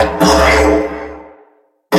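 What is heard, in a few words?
creepy sound of failure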